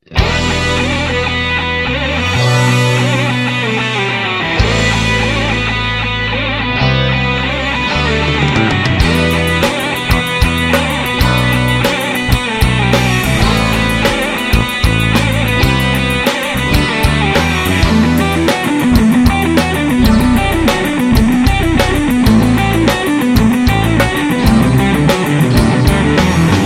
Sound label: electric guitar classical touch